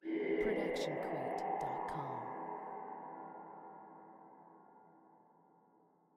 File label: Soft Ghost Voices; SoundsCrate